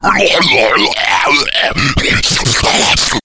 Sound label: idle, slender shiver